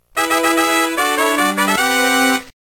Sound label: Card Scan Complete!